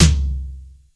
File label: SYNC TOM, TECHNO; Crypton - DrumBase5000; TECHNO #2